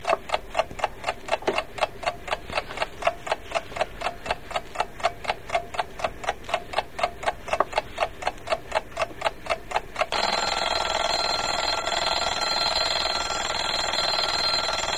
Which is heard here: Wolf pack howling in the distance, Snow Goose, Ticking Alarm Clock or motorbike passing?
Ticking Alarm Clock